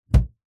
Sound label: foley balloon inflated valve pull release thud